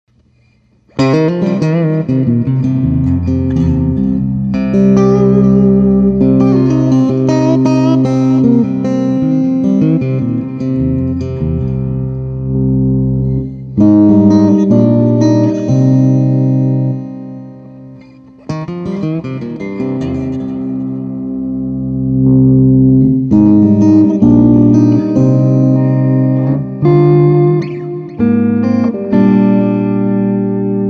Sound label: piezo